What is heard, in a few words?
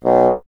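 wind bassoon B; Miroslav Vitous - Solo Instruments VOL-1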